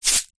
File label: cake decorations hundreds thousands tube shake; food